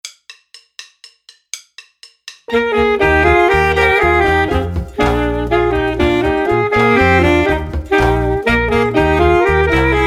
Caribbean Capers Full Sax

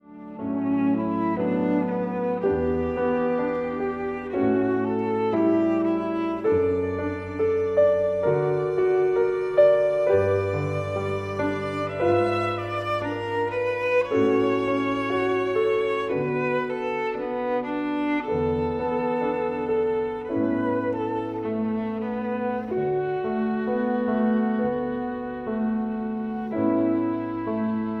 VIOLIN

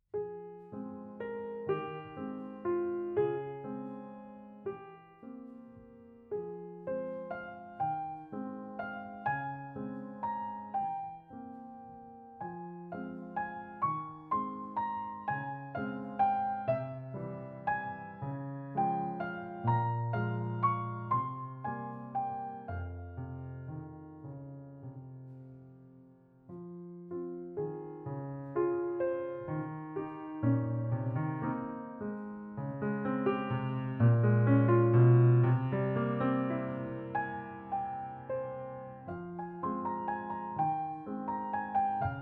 piano

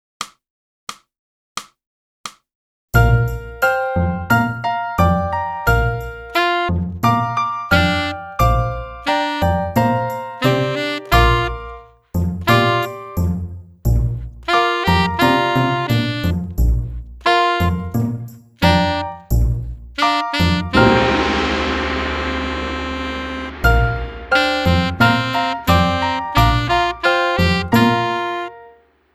sax

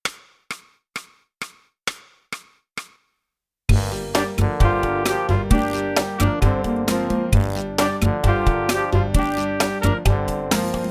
clarinet

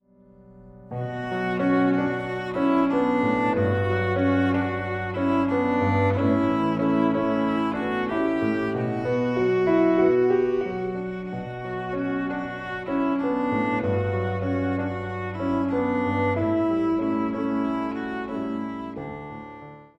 VIOLIN

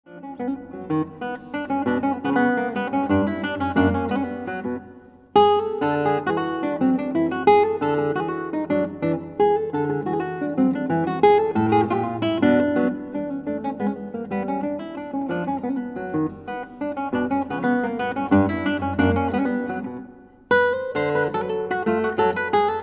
Guitar

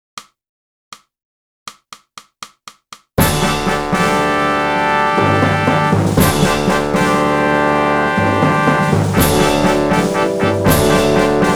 clarinet; boosey method

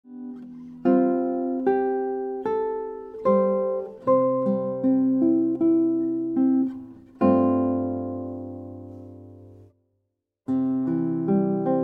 Guitar

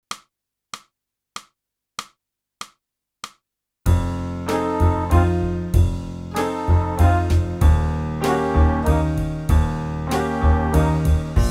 clarinet; boosey method

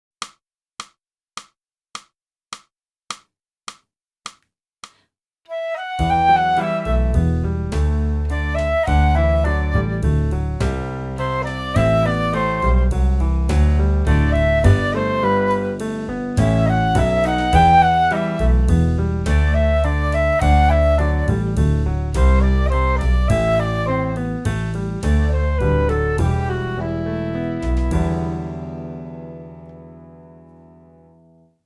flute

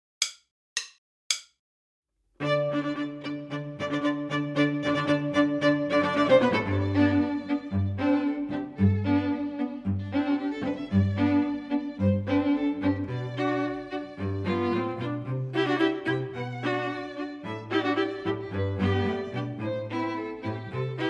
minus Violin I

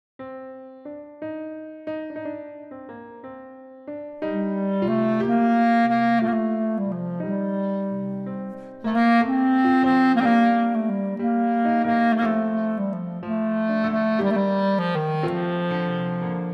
Full Clarinet

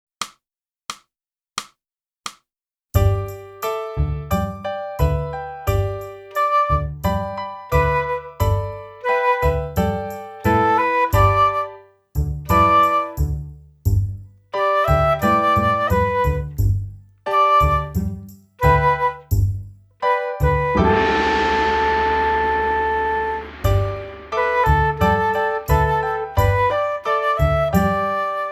flute; boosey

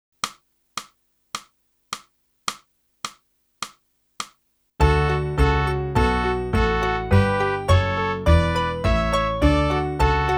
sax

